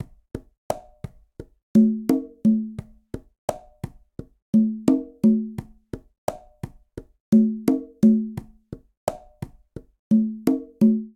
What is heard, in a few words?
Bolero Conga Tumba-86bpm; Latin Percussion; Ableton; Factory Packs